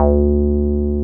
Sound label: 303 SQR D3 MF, SQUARE BS